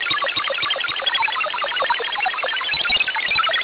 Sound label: mfsk-38